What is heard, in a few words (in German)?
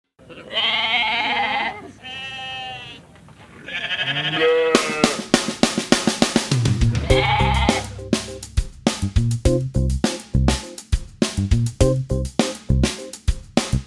Ziege